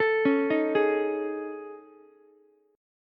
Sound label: A Major 7th Flat 5th, Ukulele; arpeggiated